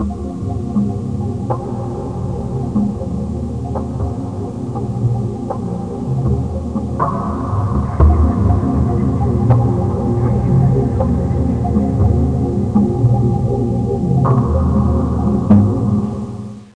WATER

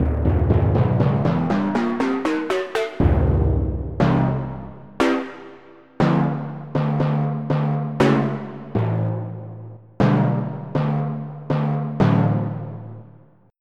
Timpani; FF7

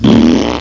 rasp!; charley cat mouster mind

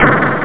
alien die; Insectoids2

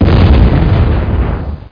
EXPLOD; rott

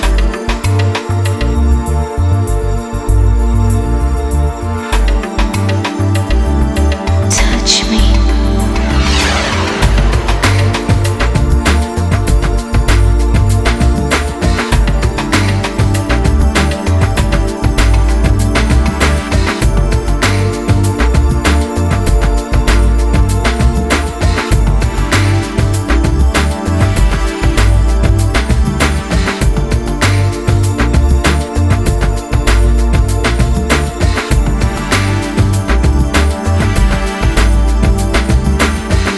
loop

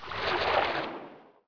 swim; HEXEN2

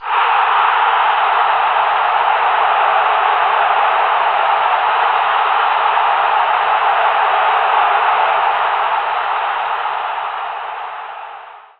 goal scor

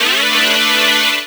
Warning; TumikiFighters

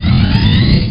respawning; axisdemo

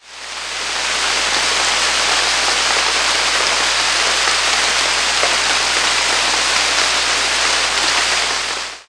Rain; Hellboy; CD Expert nº 41

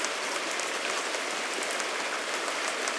rain street; The Sum of All Fears

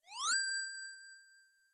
Tumbler Falling; Pushover

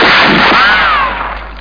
Gunshot; World Of A1200

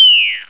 Falling Star; CephaloTron

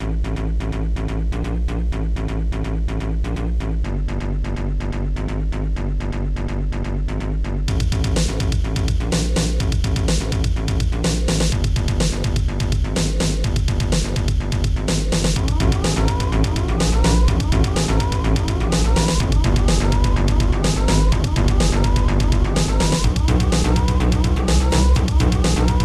battle; Digital Symphony Collection

